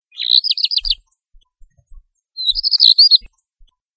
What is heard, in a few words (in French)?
rouge queue